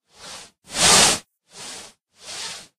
Fabric Drag

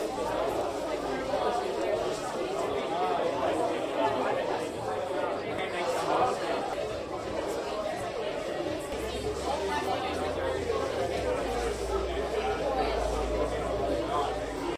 amb Crowd Interior

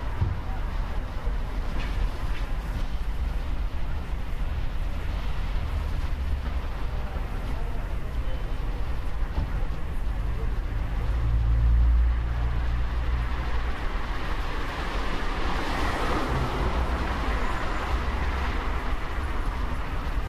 amb Street Busy

